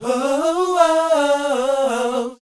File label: SHOO BE, Vocal R&B Dance; Spectrasonics Vocal Planet